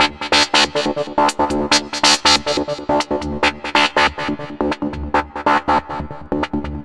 Synth

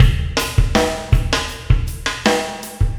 Acoustic Drumloop

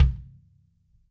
Kick, Drums & Percussion One Shots Drums

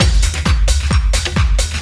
Dance Drumloop

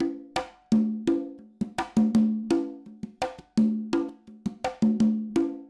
Conga, acoustic percussion loop; Drums & Percussion; Percussion Loops

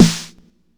Snare; Drums & Percussion; One Shots Drums

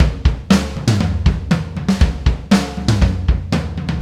Acoustic Drumloop

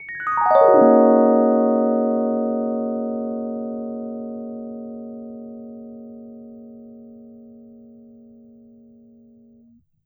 E. Piano

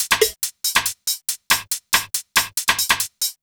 Electronic Perc; Drums & Percussion; Percussion Loops

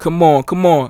Dry Vocal

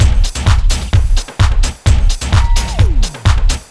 Dance Drumloop

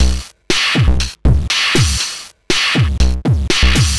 Electronica Drumloop

